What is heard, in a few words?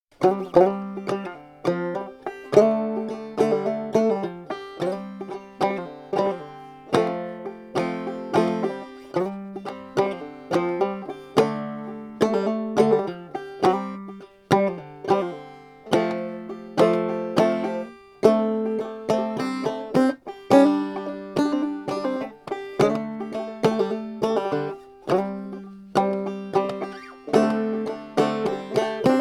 Gravel, instrumentals for banjo